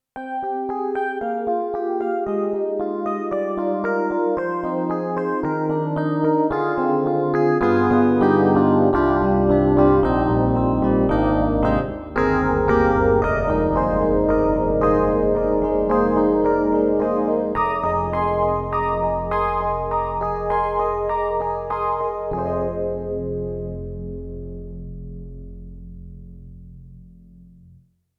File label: YAMAHA MU90 DX Phase Electric Piano